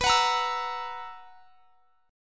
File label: B Major 7th Suspended 4th B down; strummed